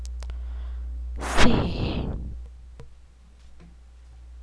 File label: sea